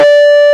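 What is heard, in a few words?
mild harmonics D3, guitar; AKAI S-Series CD-ROM Sound Library VOL-8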